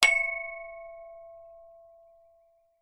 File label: impact metal pole swing playground